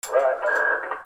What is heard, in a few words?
PHONES